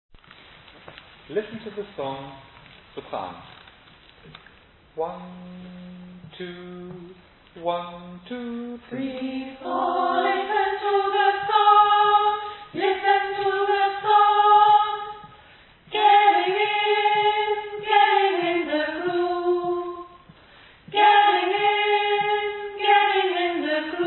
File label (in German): Sopran